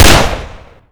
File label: new usp match; garrysmod